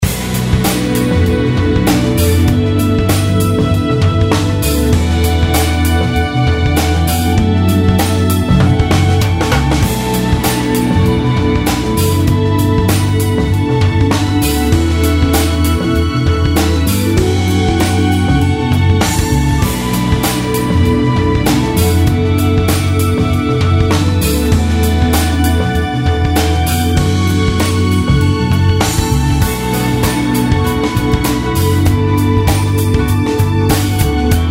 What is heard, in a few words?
bgm evolve